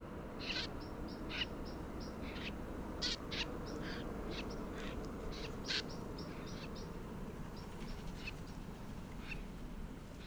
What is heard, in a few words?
Jack Snipe Common Snipe